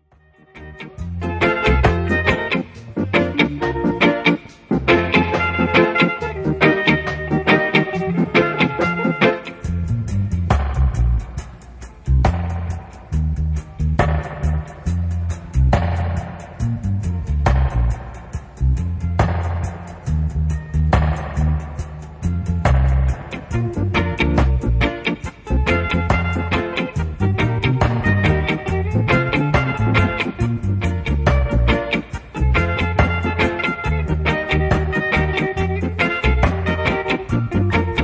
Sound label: Drum & Bass